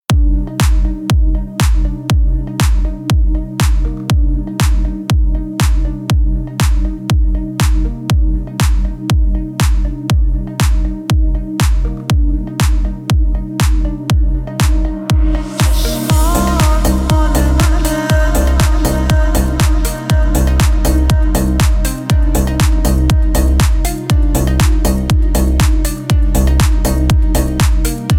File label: deep house remix